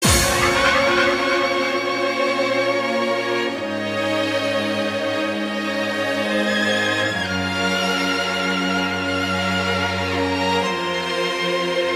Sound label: strings